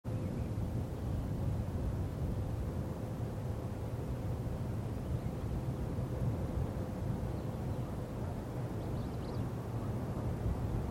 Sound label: wind soundscape; WebXR Interactions